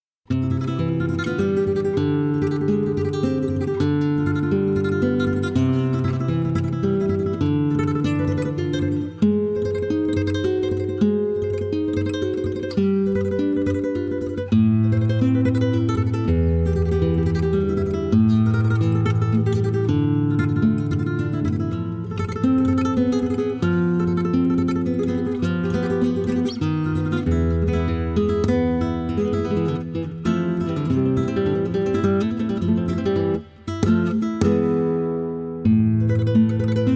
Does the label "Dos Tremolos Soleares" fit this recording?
Yes